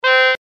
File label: Clown Horn